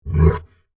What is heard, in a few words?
Monster Bleat, Horror, Monster, Bleat, Vocal, Element, Large, Creature; Monsters; Blastwave